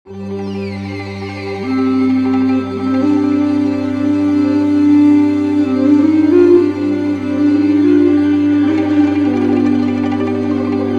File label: bass recorder; bass recorder sample library; elastic sounds